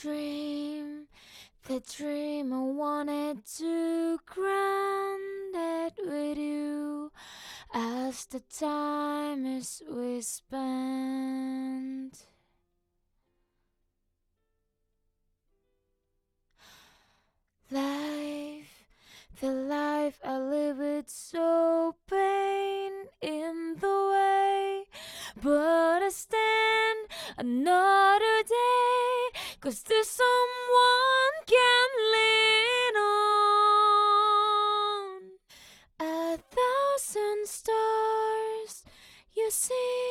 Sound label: music box; ALTDEUS Beyond Chronos; Android